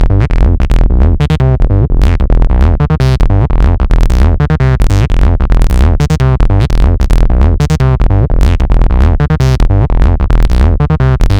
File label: Bass, acid techno trance; Juicer Bass; acid techno trance vol2